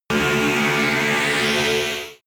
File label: extrinsic buzz, energie